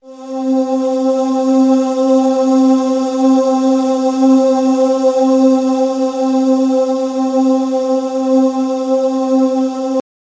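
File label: Voices with Crossfade Loop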